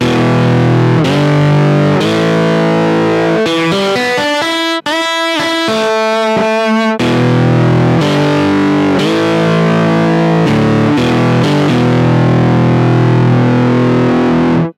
E Guitar Fulltone GT500

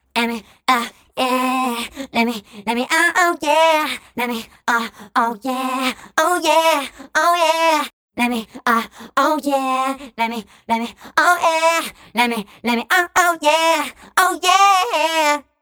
PolyVox

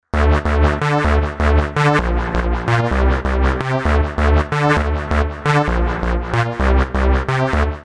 Bass Hard

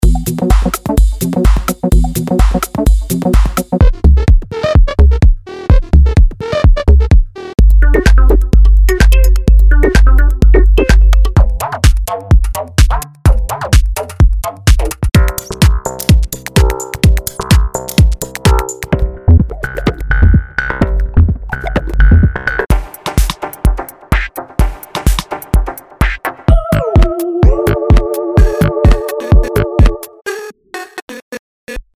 Minimal Tech House; Sample Magic

